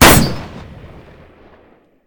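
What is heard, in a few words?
grenade rifle; WAR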